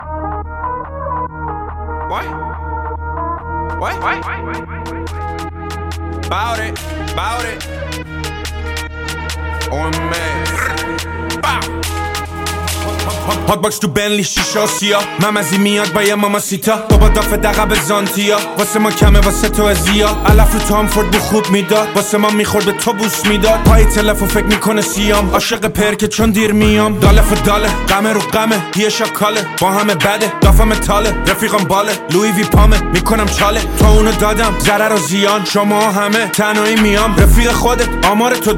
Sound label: music shad car